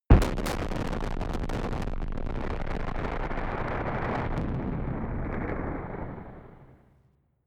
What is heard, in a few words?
gun pop gun shot distorted